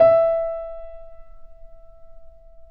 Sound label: Mp E 4 off, Grand Piano off mic; AKAI S6000 CD-ROM - Volume 2